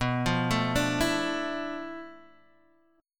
D Minor 6th Add 9th B

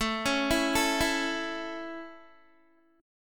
A Major, Guitar; arpeggiated; 17,16,14,14,16,x

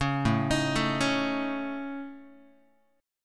A Major Flat 5th, Guitar, AMb5 chord; arpeggiated; JGuitar

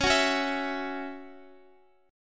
Db Minor Double Flat 5th Db Guitar; strummed; Dbmbb5; JGuitar

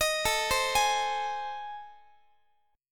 A Minor Major 7th Flat 5th, Guitar; AmM7b5; arpeggiated; x,x,18,19,16,19

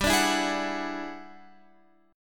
E 9th G down Guitar Standard; E9/G

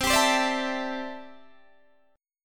C 6th Add 9th C down Guitar DADGAD

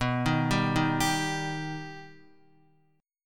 B Augmented B down Guitar; arpeggiated; JGuitar

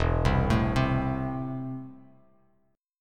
E Suspended 4th Sharp 5th, down, Bass Standard; arpeggiated; JGuitar

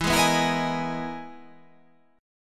E Major Sharp 11th E

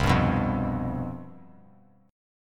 C Major 7th Suspended 4th, down; strummed

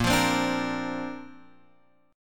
Bb Major Add 9th, Guitar, Open F; x,5,5,7,5,5; strummed

